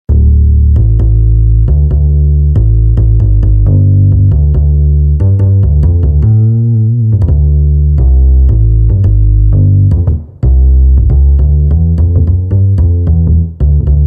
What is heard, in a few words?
Acoustic Bass